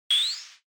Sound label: Arcade Shooter Shoot